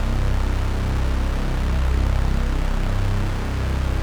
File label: Huge Bass; Vostok Inc.